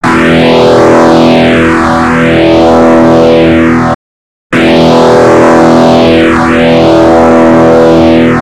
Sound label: phase shifting